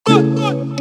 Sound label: Instrumental; Prod. By Genesix Did-It